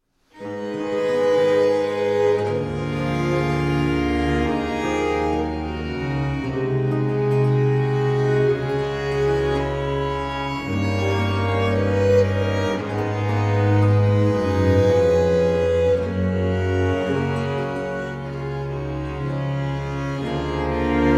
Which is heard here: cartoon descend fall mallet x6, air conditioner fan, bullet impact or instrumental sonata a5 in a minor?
instrumental sonata a5 in a minor